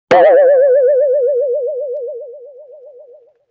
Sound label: Saw Wobble, Vintage Cartoon